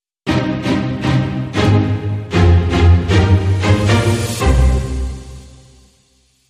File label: Sting No Drums No Lead